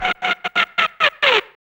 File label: FX Dance